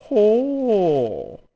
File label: Hoohh; Kamaro: "Ho-ohh!"